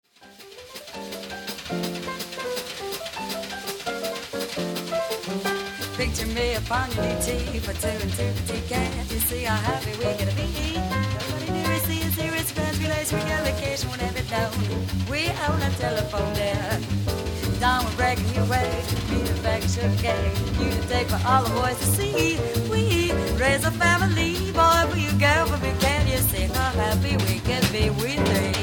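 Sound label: Female Voices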